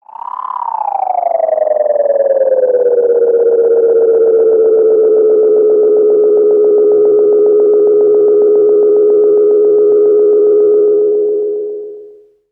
Tape Delay Feedback; radiosonics

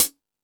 Stadium Rox, Drum Machines, E-MU Pro-Cussion

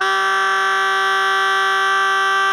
SOPRANO G 3, Saxophones, GRWL; Greytsounds; Philip Wolfe David Crozier's Saxophones

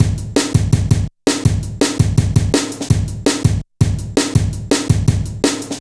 Heavy Live A, Drum Loops, Jungle

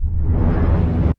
cinematic impact wipe

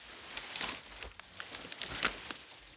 Trudge Step, outdoor, Footsteps

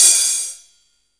HH, Drum Dry; East Collexion; Drum 1 Dry

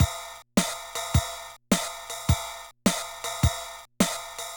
Loop, classic machines, rpm 40

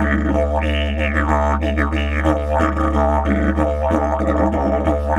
D 93 bpm, didgeridoo; Big Fish Audio - Didgeridoo and Other Primitive Instruments

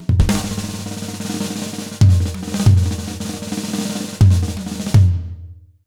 100 B BEAT, Jazz Latin Drumloops; USB Soundscan vol.08; AKAI

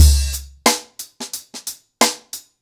Ripple Effect 90BPM, acoustic drums

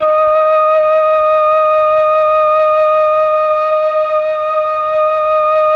MELLOTROF

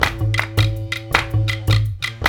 World Dance; OZ!

